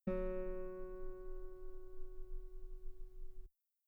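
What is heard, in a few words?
guitar F sharp 2 very long piano normal; bananenkeyboard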